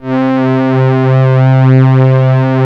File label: WEAKY C3, SYNTH; Q Up Arts; Dream Experience